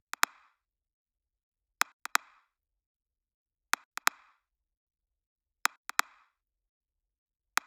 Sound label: Bass House 125bpm Percussion; EDM Drums; Drum Kits; Stems; Ghosthack; Ultimate EDM Drums; Bass House Kit 10